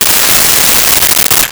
Jig Saw; Tools; 1001 Sound Effects

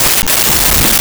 skratch; Sound Effects Library (28Cds) plus bonuses

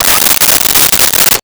Cordless Electric Drill Tighten; Tools

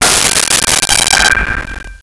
Metal Ting

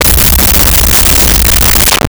Low Descend, Science Fiction

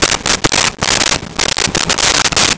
Write on Cardboard with Pencil; Office Supplies; 1001 Sound Effects

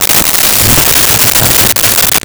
Electrical Current Strong; Home & Office

